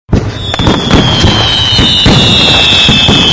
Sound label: Fireworks